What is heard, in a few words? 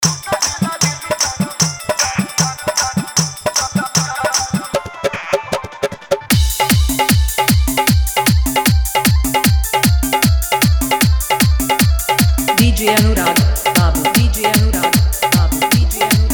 Hard Dholki Bass Mix